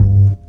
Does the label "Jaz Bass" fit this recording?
Yes